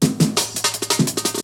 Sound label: BREAKBEATS, TECHNO